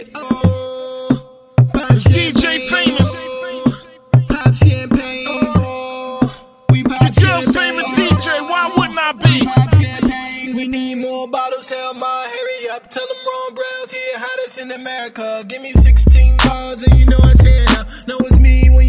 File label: pop champange